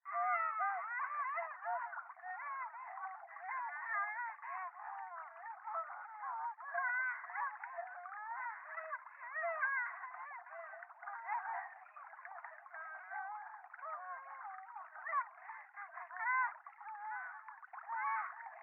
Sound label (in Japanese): 電話 シャブ喘ぎ 川のせせらぎ